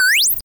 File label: Sound ACHIEVEMENT; Diamond Hollow 2